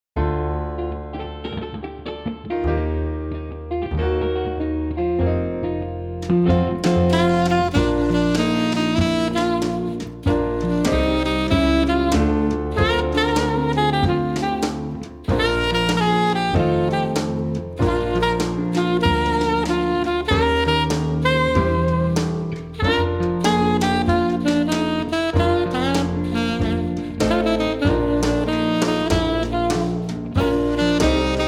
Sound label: Traditional Jazz